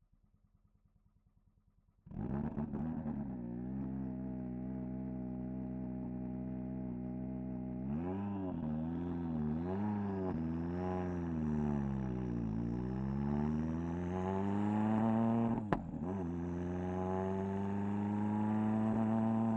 Ford Fiesta WRC2 - ONBRD - MEDIUM - Drive Various - EXHAUST - Distant; Pole Position; Ford Fiesta WRC2 2022